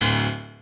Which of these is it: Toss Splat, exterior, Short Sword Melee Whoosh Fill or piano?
piano